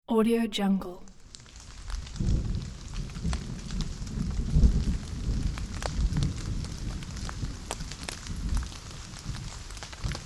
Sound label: soft rain and thunder